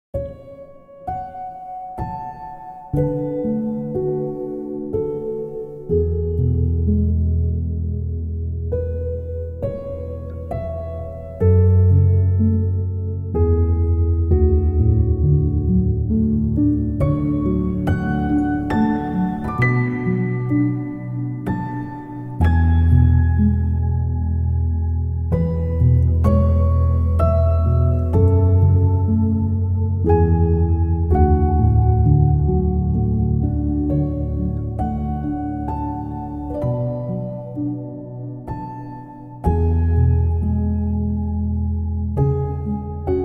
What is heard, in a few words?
piano cover; gladiator soundtrack